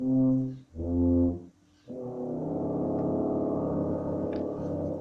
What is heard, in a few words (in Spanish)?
Multifonicos